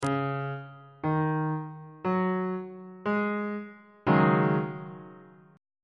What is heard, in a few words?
a flat dominant 7th suspended 4th 1st inversion